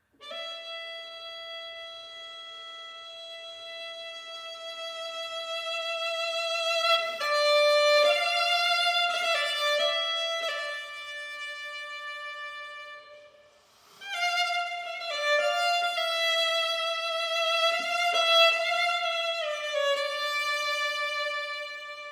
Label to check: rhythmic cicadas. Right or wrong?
Wrong